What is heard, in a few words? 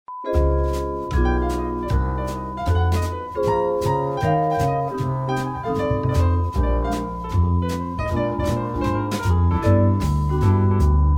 groovy jingles